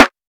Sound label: smooth snare one shot c sharp key